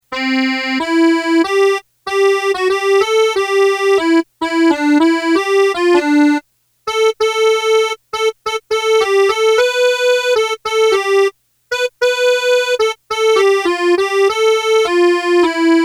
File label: melody